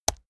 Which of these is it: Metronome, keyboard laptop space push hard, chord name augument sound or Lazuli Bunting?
keyboard laptop space push hard